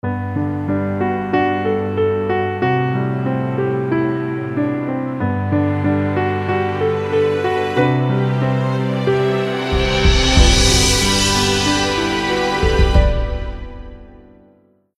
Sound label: Lullaby